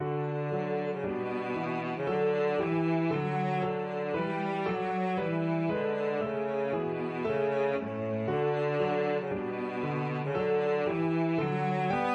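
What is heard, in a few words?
violoncello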